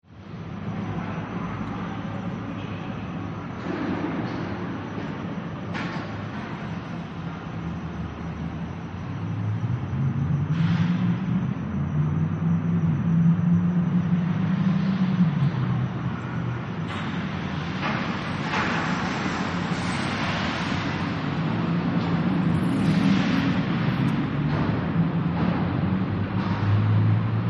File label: parking lot, Ambient Sound Of Afternoon At The Parking Lot Of A Mall; Target Parking Lot; Stores and Malls